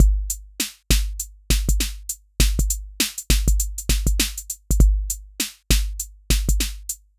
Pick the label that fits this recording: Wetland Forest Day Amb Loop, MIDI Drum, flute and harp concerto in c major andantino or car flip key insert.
MIDI Drum